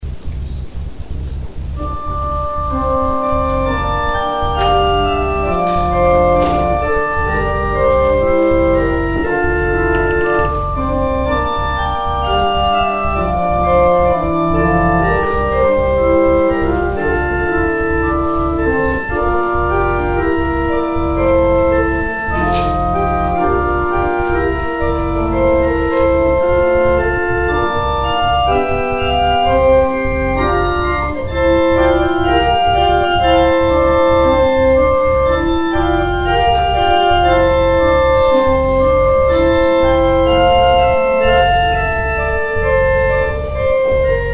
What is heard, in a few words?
organ interlude; during the offerring